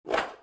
whoosh coarse harsh

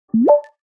ui sound

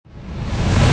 dramatic buildup whip

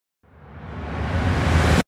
dramatic buildup

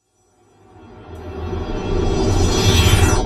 cinematic reverse

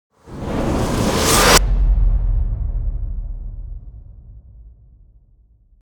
cinematic whoosh reverse

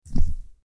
swoosh fast with thud

